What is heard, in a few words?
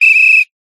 sports whistle soccer burst